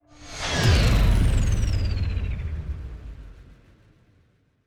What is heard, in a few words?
ship move; ships; skrand; Stellaris; paragon